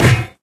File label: physics turret impact lg